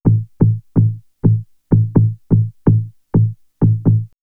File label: Vocoder Loop; X-Static Goldmine; VocoderLoops